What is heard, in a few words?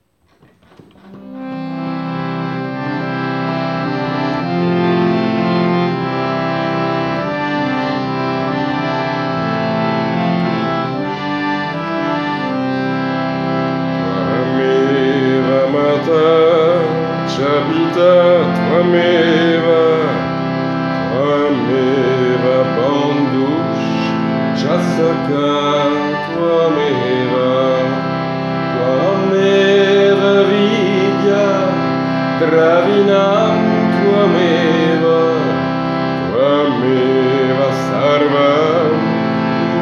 harmonium; Twameva